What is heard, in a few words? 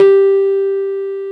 G3, Keyboards Old School; USB Soundscan vol.09; AKAI